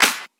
Claps & Snares

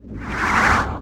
Sweeps Short

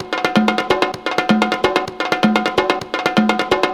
Percussive Loops 128BPM; Vengeance Samples

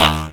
Percussion; Vengeance Samples; VEH2

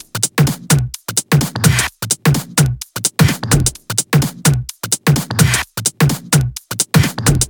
128BPM Big Floor Groove Kit; Vengeance Samples

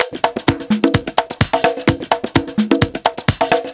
Latin House Loop 128 BPM; Vengeance Samples

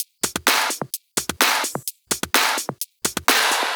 Minimal Loops

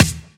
Snare; Vengeance Samples

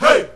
Chant

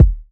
Kick; Street Anthems Sound Kit

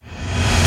Short Fills & Reverse; Vengeance Samples

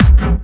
Bassdrums Clubby Kicks; Vengeance Samples

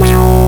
Synth Shot; Vengeance Samples

